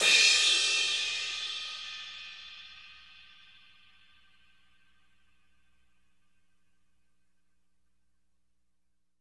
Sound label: CRASH